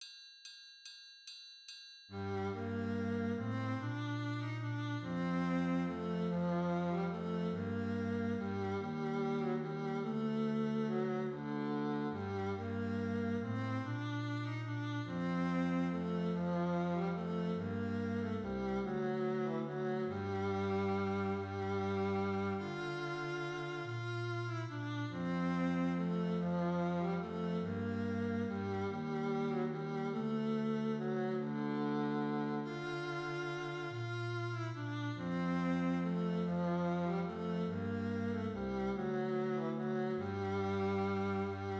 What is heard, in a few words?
viola bass duet in Bb MIDI clicktrack; What Child Is This